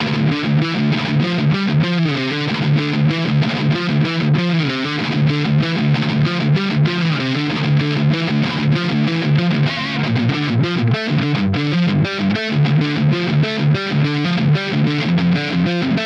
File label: high gain mitte, Gitarre, ESP LTD MH 1001 NT